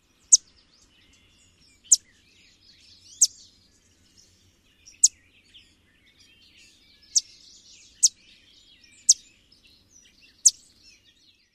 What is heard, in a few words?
alarm chips; NY